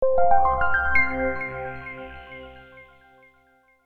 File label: Bright Reminder Ascending Notes; Software Logos